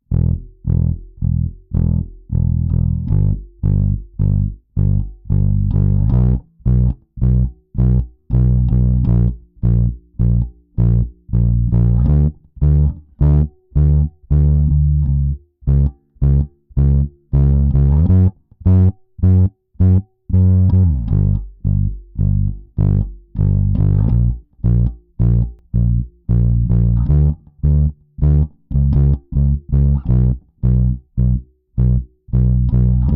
Finger Screamer Comp

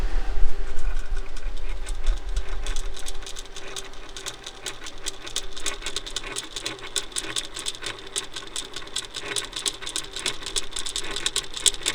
Timer Ticking Server Room

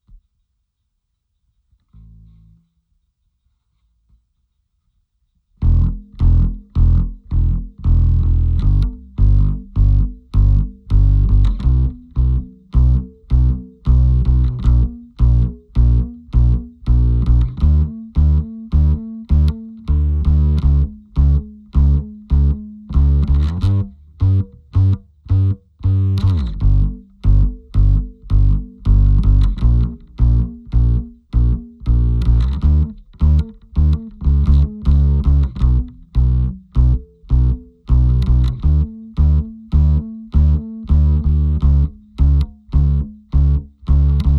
Upright BB-1X Comp

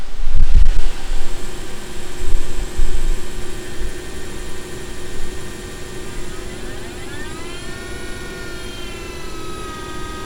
Ambient Server Room Tape Drive

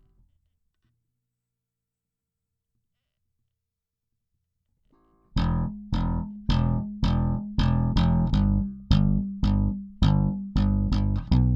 Slap Clean Comp

